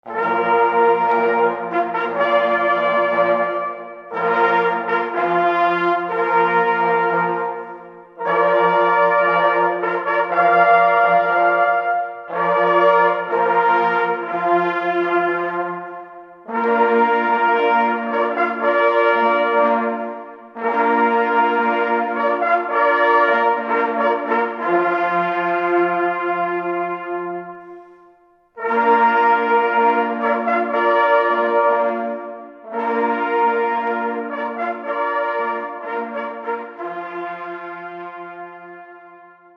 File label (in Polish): fanfara; Lubuska; koronacyjna